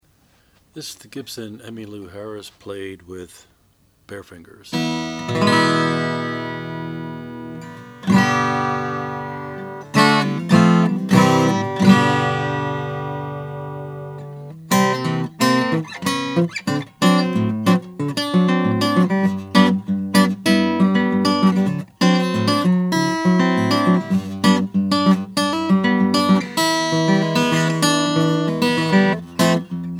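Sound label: gibson l 200 sunburst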